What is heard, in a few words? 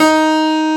Big Band Fast; Roland L-CDC-01